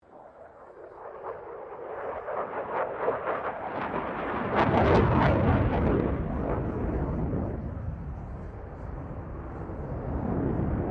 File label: AIRCRAFT FA-18 HORNET PASS; RAAF FA-18 Hornet; Airplanes; Sound Effects:Vehicles